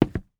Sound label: Footsteps Wood; HauntedBloodlines; SFX Producer